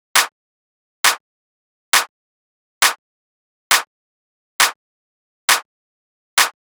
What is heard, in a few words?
Clap Loop 135, Techno; Drums; Ghosthack; Techno Sounds 2025